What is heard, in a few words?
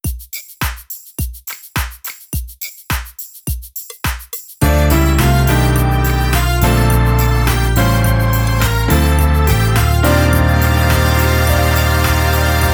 First Sunday Jam drumless